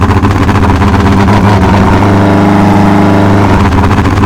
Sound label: exige on very low